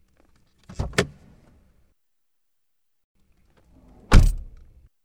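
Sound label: Car trunk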